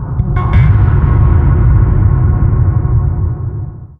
mysterious perc, halloween ambient

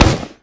stickybomb launcher shoot; tf2

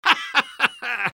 soldier laugh short; vo

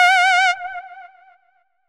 class menu, music; Garry's Mod; tf2 content; mvm

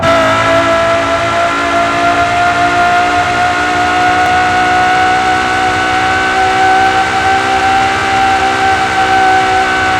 second, vehicles laferrari; tralalelo ferrari; sim fphys fer laferrari

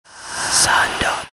hallow, ambient; Garry's Mod; tf2

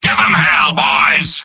battle cry; soldier; mvm

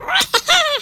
skelly small

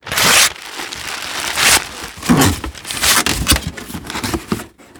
item gift wrap unwrap

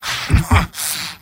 m laugh evil; tf2; garrysmod; mvm

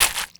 octo steps; Garry's Mod; tf2

